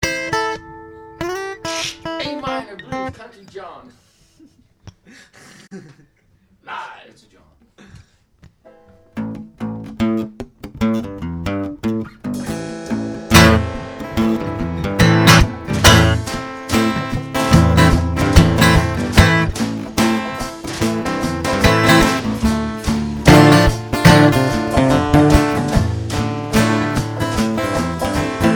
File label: Interlude